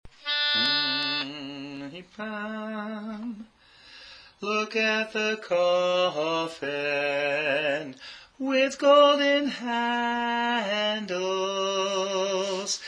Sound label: Lead